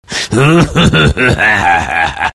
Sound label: laugh